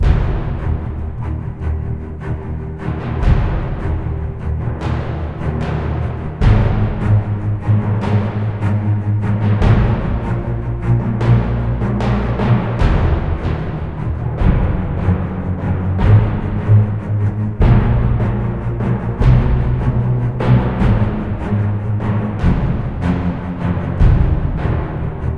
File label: Battle Sequence; mass effect 3 citadel gamerip 2013; Arena